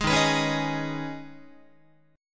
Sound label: Eb 7th Suspended 4th Sharp 5th Eb, Guitar; 11,11,14,11,12,14; 4A,4E,5A,5D,5G#,6C#; strummed